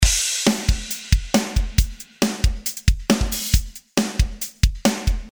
drum sample; mtdrum

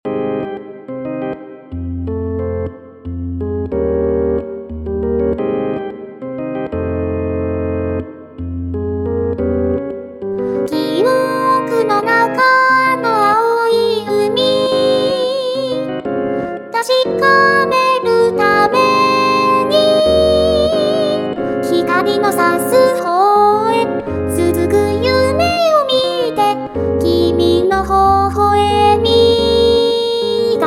VINTAGE ORGANS

